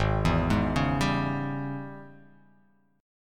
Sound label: G sharp Major 7th Suspended 4th Sharp 5th